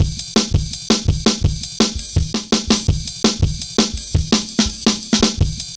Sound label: Jazz Ride A, Drum Loops, 166.5; Zero-G Jungle Warfare 1, 2 & 3; 90 s Sample CDs